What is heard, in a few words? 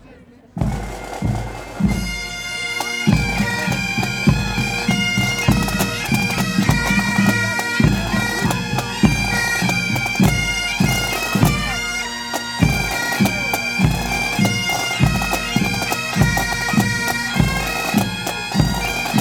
scottish pipes march